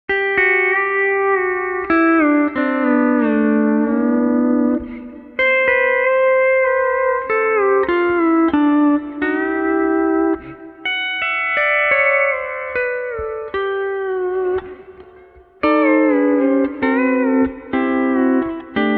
2nd String Tuned To C Sharp, Excel D13 S12 Keyless